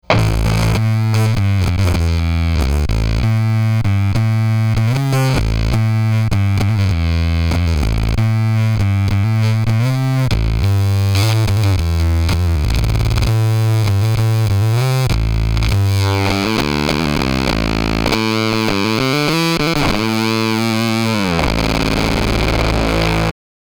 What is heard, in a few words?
Bass War Bass Fuzz Max Mix Max Tone Sweeping; Death By Audio; pedales efectos fuzz